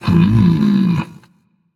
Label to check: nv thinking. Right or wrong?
Right